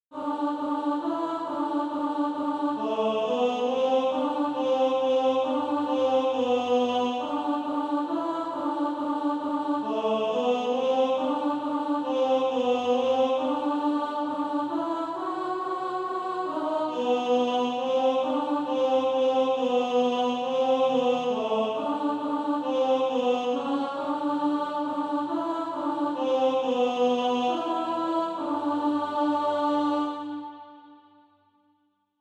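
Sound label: alto, Practise Music; SATB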